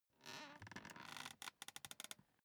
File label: office chair creak sit up